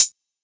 Hihat

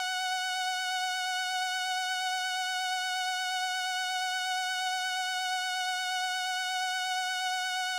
Lead, Synths

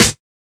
Snares

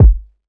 Spliced Kick

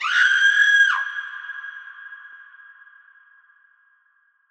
Scream

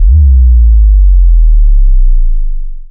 808's

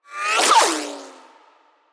fast car Sfx

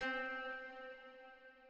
Recreate One Shot

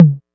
Perc

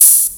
Open Hat; Sound Design; The Lunch77 Sound Design Mini Kit (Vol. 1)

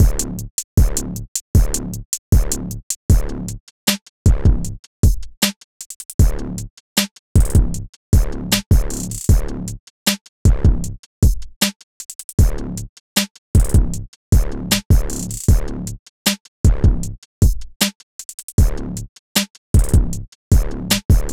Drum Loop - 155 BPM

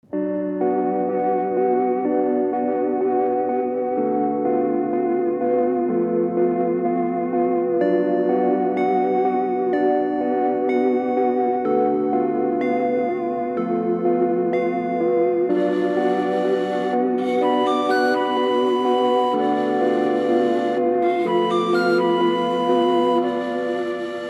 125 BPM

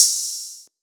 Open Hat

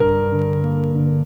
Loop

